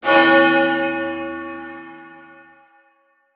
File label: bells; destructibles